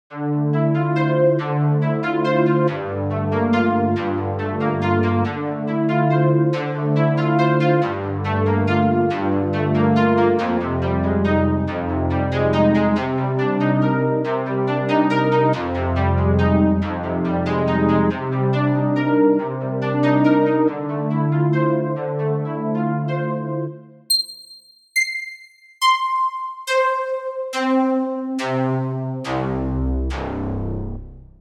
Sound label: Noise Saw, struck instrument simulator